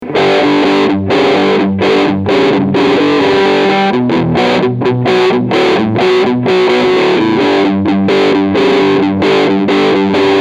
Full Gain

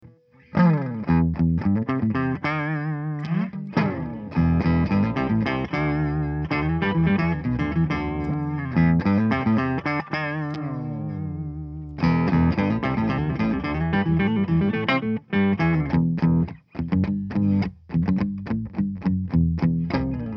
Oct down plus dry

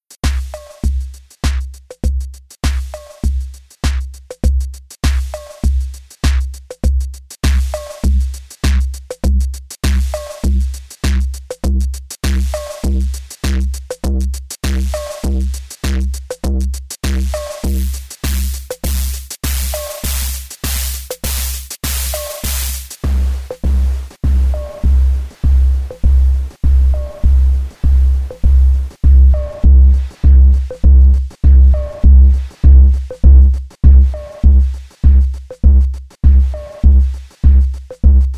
Boom Lofi Distortion Noise Saturation

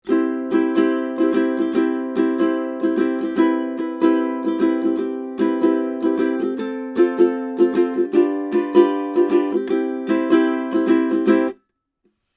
Schoeps MK4 CMC1 Ukulele 20cm

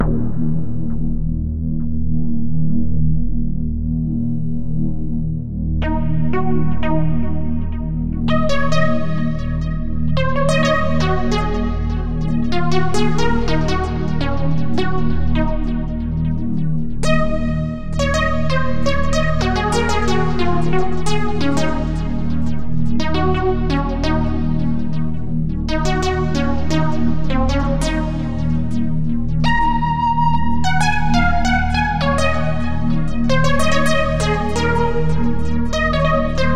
nitron

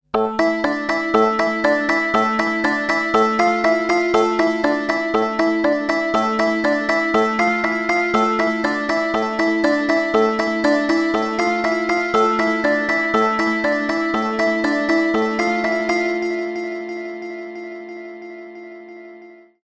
Etherial Fuzz vs Microwave; Fireball

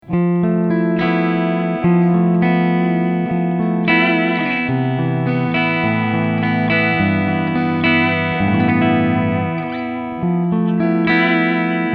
Clean

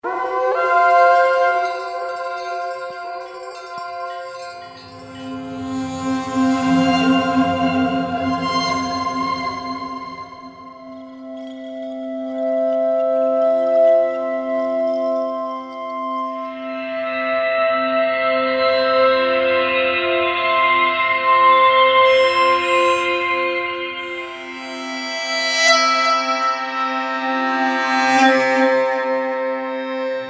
psycho

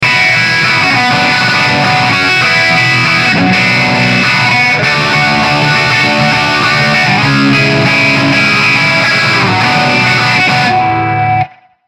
Phaser

